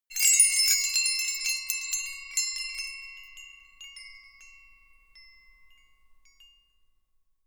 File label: windbells ringing